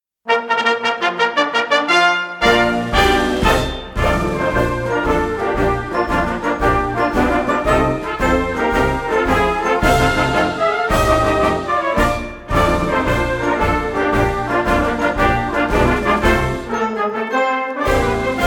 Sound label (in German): Stuelpnagel-Marsch